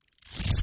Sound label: velcro tear